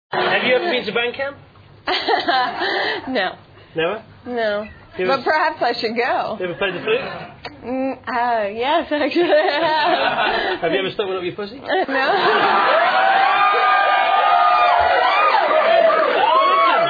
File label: Flute